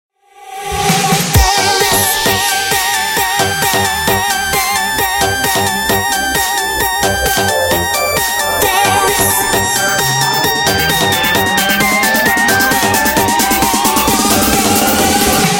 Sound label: dance ring